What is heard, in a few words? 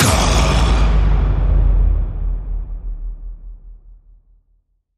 Vocal Fury Growler Hit Hyped